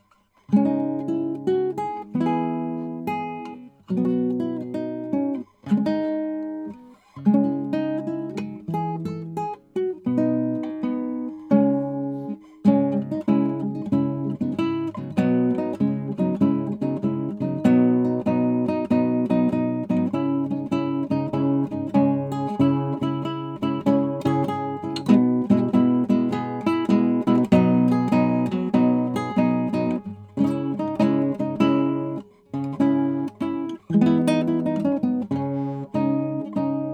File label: Sprightly Improv